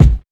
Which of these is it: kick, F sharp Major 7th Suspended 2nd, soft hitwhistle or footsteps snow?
kick